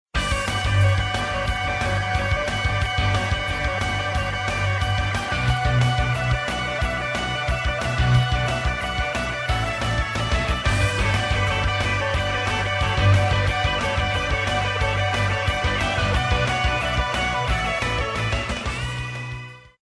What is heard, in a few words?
rock heavy